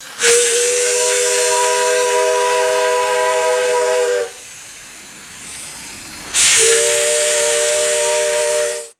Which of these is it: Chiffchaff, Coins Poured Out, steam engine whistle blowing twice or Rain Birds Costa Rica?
steam engine whistle blowing twice